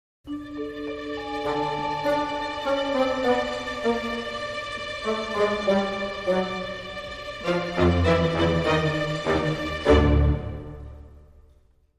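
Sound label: Dramedy Sting, No Percussion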